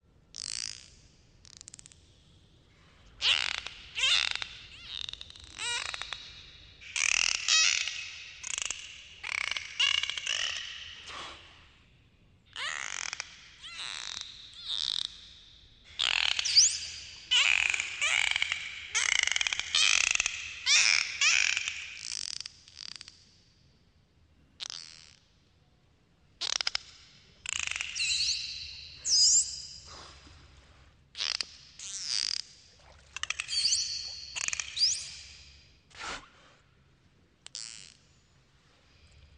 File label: Dolphin